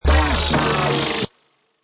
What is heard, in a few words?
Scratch Slide 100, Vinyl Scratch Slide 100 bpm; Dj Sound Effects